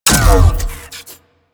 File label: Beam Stop; Warframe; Corpus; Corpus Railjack; Admiral Agent